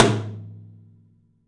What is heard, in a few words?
TOM; 3D Sound